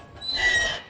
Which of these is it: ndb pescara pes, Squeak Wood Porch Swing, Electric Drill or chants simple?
Squeak Wood Porch Swing